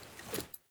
Foley Action Default Polearm Fill, Foley Default Clothing; Characters; Player